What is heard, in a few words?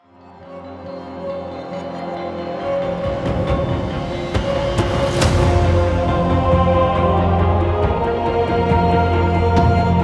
Login Music; Login Screen